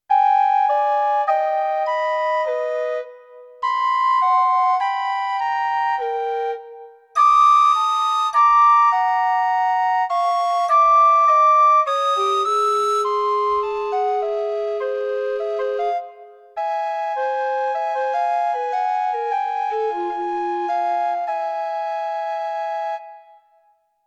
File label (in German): Lamentoso Blockflöte